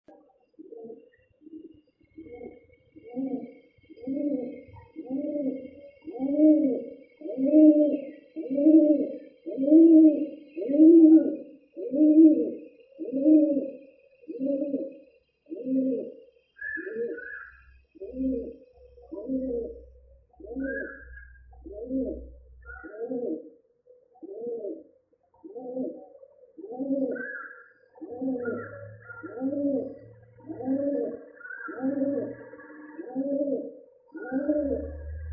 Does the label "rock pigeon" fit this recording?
Yes